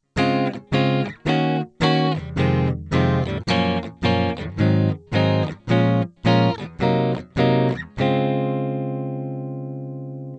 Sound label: csmaj7 chord scale